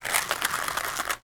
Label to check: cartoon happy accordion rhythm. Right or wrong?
Wrong